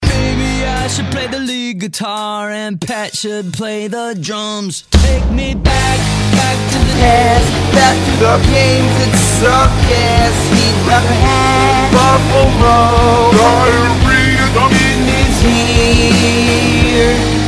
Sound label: laugh